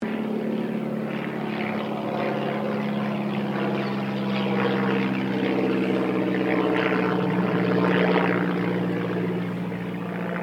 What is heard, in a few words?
AIRCRAFT DH82A DEHAVILAND TIGERMOTH PASS SLOW; 1936 Tigermoth; Airplanes; Sound Effects:Vehicles